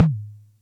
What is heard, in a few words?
Music Percussion Computer Tom, Drum Machines